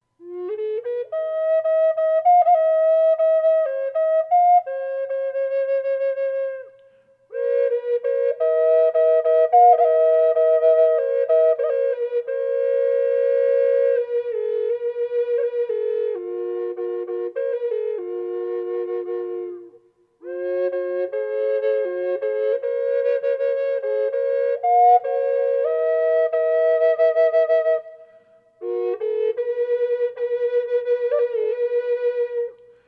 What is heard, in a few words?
doubblle reverb, Drone Flutes; Sample #2 with a light reverb; Heartsong Concert Drone and Double Flutes; Sedona